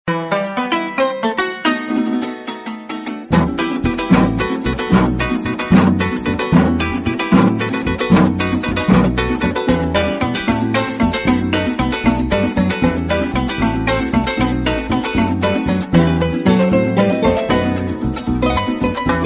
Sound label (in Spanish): Corrido